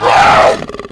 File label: medic pain